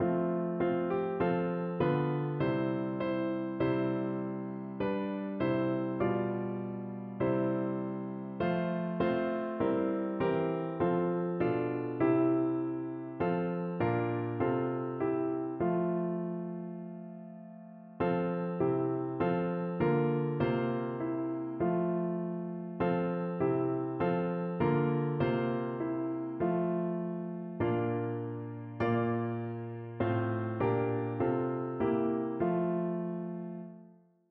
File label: PNO